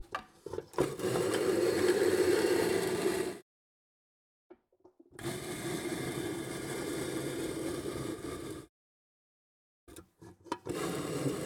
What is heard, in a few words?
Metal Box Sliding